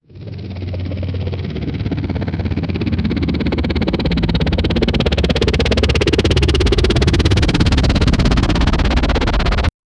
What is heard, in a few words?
helicopter sounds no air